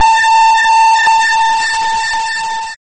Loud ringing

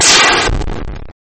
Hiss then sizzle

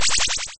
Squeaking target hit

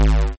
Short buzz

